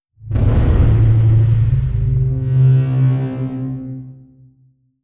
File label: distance shieled fire layer; apocalypse